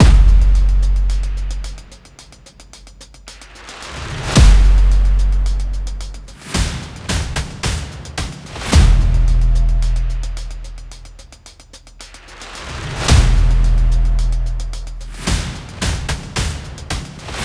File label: Drums 110